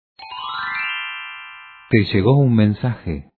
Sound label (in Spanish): te llego un mensaje